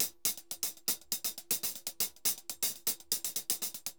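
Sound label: HH L, salsa drums and percussion; Best Service ProSamples vol.14; AKAI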